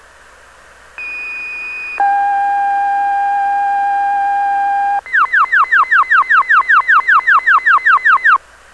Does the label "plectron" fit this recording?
Yes